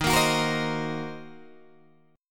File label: Eb Minor 6th, Guitar Open G